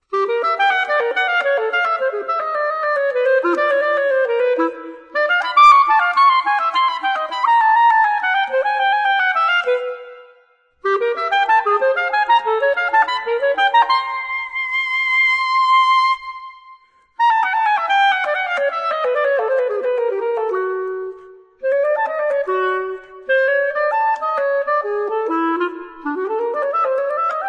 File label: jazz studies; Studio N 2